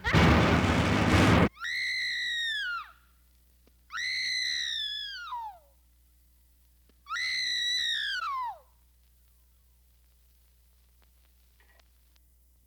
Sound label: Woman Piercing Scream